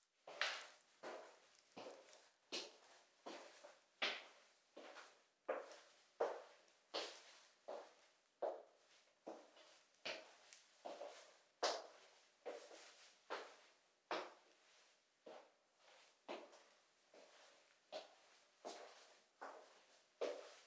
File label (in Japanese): 廊下を歩く; 足音系